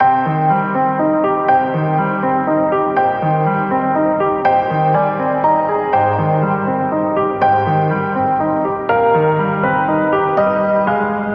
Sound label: scary or sad loop